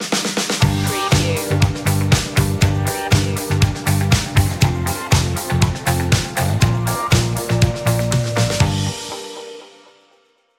catchy groove stinger

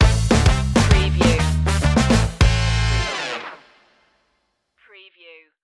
funk stinger

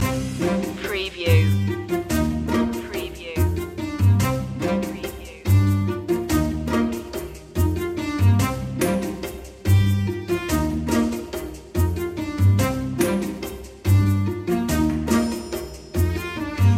curious machine loop

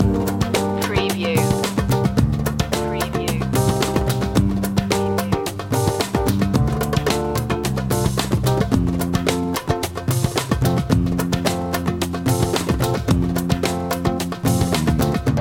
persian night loop

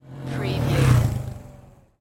Motorcycle Dirt Bike Drive By Left To Right

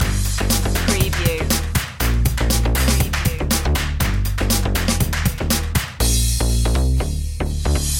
latin groove loop; media music now

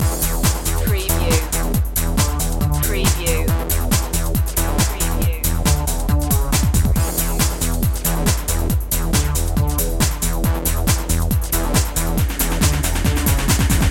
accelerator loop

